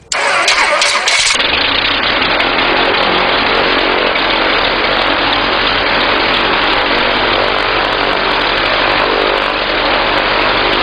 Lycoming O 540 Startup And Idle

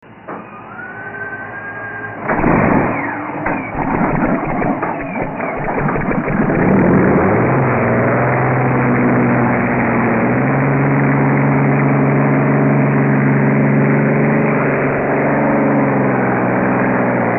Merlin Hydro Plane

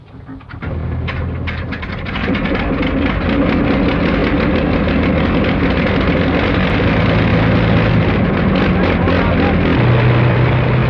Lanc Start Up And Idle; Dambusters

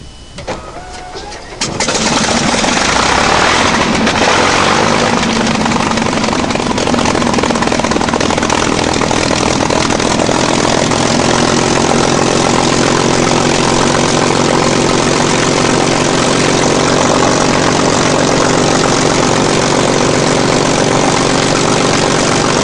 P51 Start And Idle

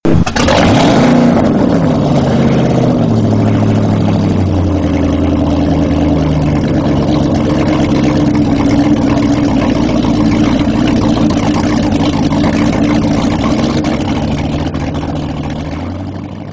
Single V8 Start And Idle Short Fade Out; Riva Ariston

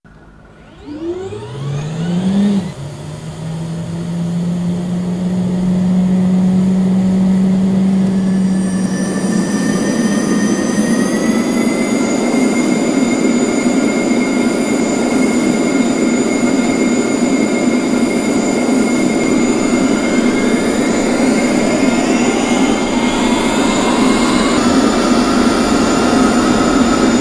GE TF34 100 Turbofan Start Up

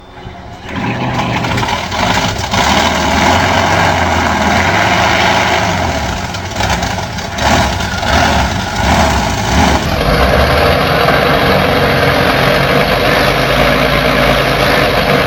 Merlin Thunderboat Start And Idle

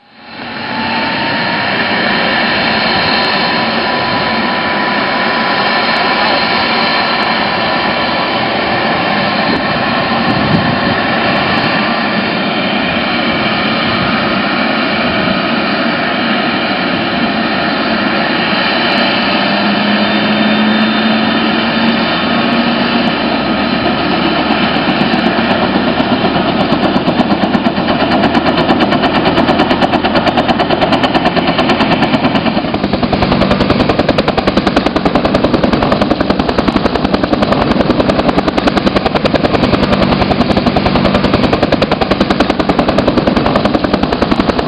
AH1 Super Cobra Startup And Idle

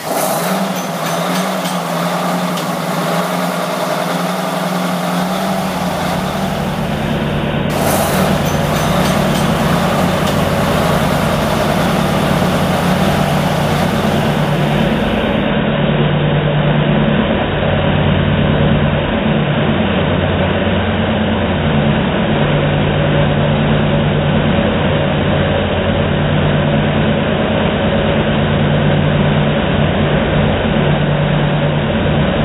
Detroit Diesel 16V149 Twin Startup And Idle

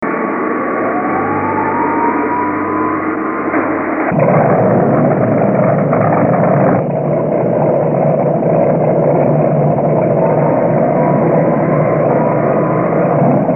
PT109 Start Engines

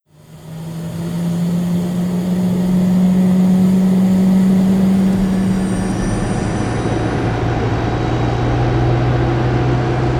Airbus A320 Startup

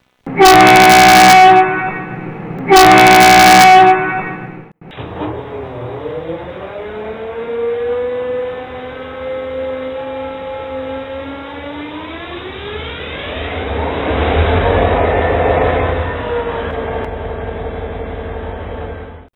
Tug

